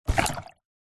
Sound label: household plunger push down plunge shallow water; zapsplat